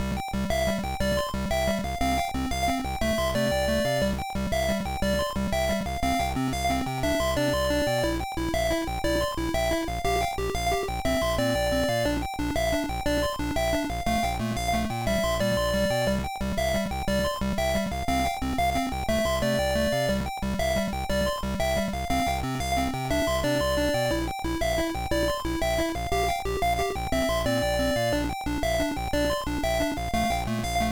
BGM; card party 2002 gba

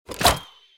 crossbow shot; Out of Reach: Treasure Royale

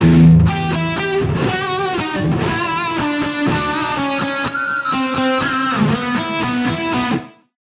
Guitar NutCracker Melody

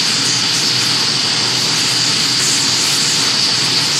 steam pipes tight

